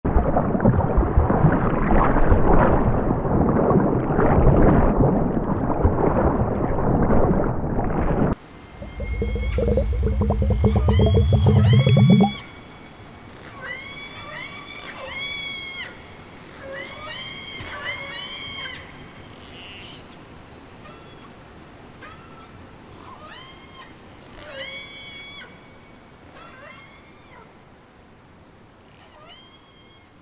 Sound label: Soundscape